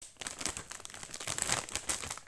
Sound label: chips